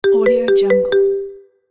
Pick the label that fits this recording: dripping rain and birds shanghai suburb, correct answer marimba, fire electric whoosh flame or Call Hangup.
correct answer marimba